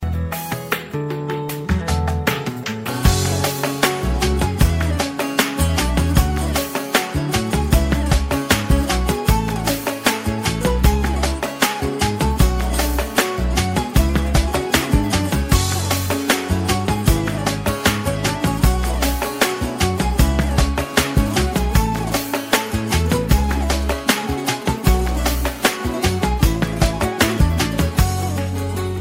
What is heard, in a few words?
Azeri